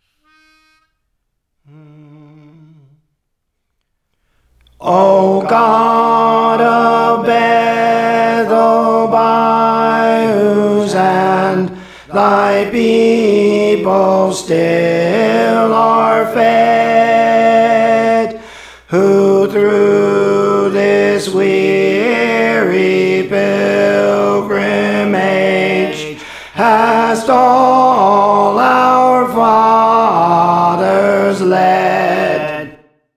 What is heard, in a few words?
Tenor, Songs; Praise For The Lord Training; O God of Bethel